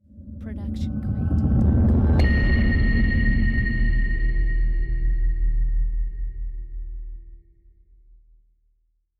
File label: cinematic rise hit; soundscrate